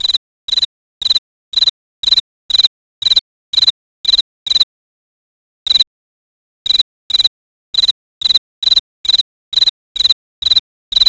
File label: cricket